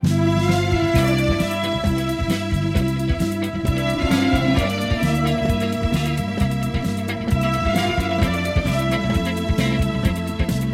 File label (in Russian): ЛИРИЧЕСКИЙ ФОН